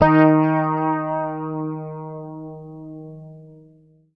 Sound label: D#, Bass; Sampleheads; Will Lee Bass Library